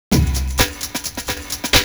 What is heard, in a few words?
jungle loop intro